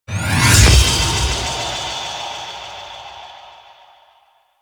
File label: Sword Assembly Pulse; Warframe; Quests; Chimera Prologue